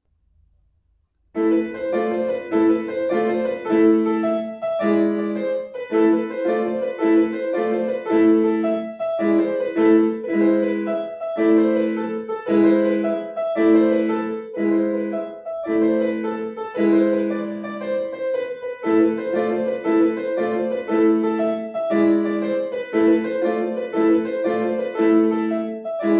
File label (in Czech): klavir, Tarantella; A. Drabon